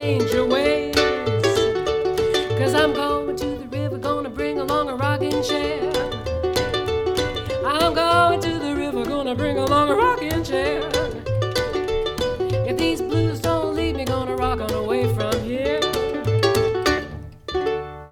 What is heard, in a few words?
Rocking Chair Blues, musicbox